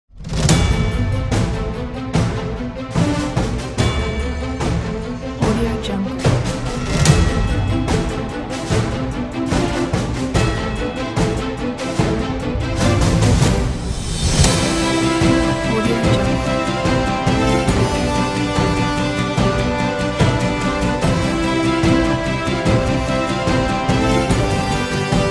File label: patriotic heroes